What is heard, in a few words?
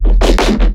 Percussive Bass Phrase